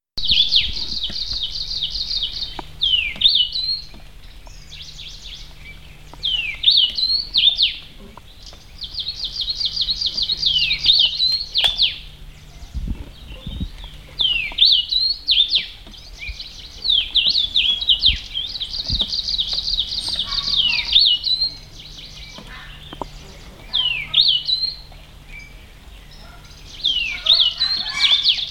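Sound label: Kamchatka Leaf Warbler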